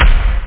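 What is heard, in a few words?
bass drum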